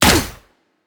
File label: Weapon Shoot